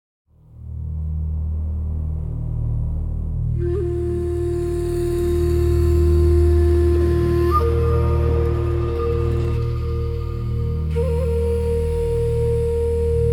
heart of the canopy a atmospheric forest soundscape soundtrack; jungle-ambience